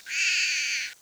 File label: meow